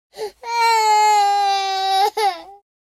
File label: toddler cries